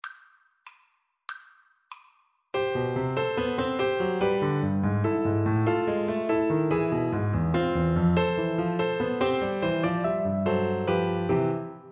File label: la paila FL kar, Flute